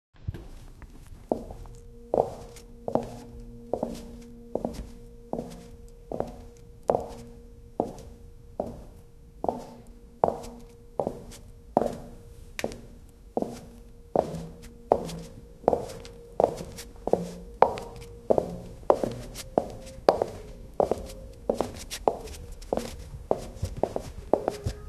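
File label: footsteps on hospital tile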